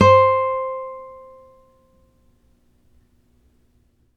NYLON F C4, GUITARS; Synclavier Sampler Library

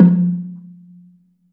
BELA F2, BELAFON, Asian Traditions; USB Soundscan vol.60; AKAI

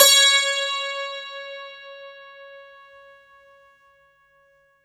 STR, SANTOOR

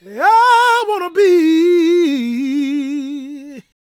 GOSPEL MALE; Spectrasonics Vocal Planet

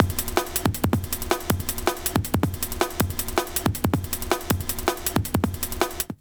160 LOOP, Explosive Jungle; USB Soundscan vol.05; AKAI

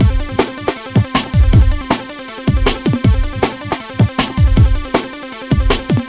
Hardcore Breakbeat, 158 Amin; Big Fish Audio - Hardcore Breakbeat Action; Hardcore Breakbeat Action CD1

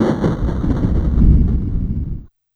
Thunder, Yamaha PSR-E323 SFX Kit

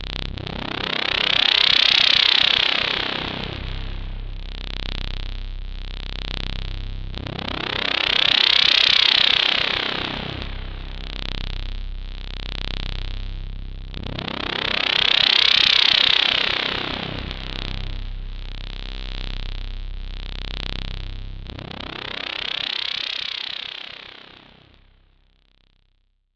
Atmos C1, Club Techno; Zero-G

